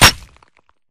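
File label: Bullet Light Ballistic Concrete impact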